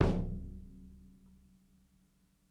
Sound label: ROOMY KICK; Basement drums vol 2